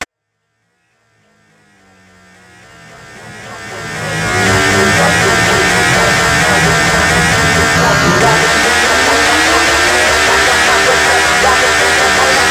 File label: phonk